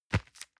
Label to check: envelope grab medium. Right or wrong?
Right